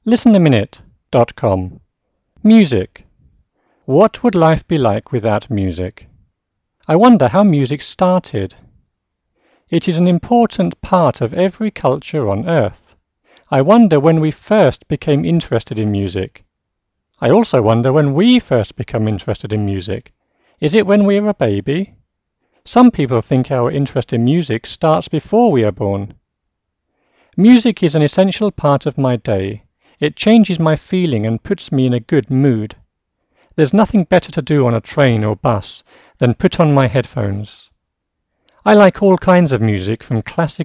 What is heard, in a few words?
music